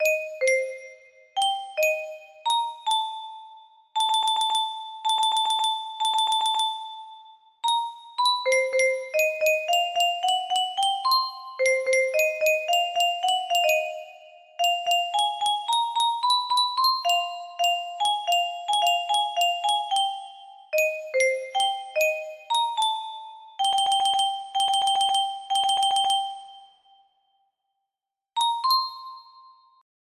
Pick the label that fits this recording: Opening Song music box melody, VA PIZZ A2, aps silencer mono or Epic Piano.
Opening Song music box melody